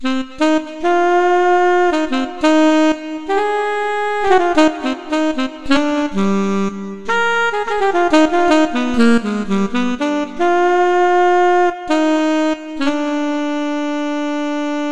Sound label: sax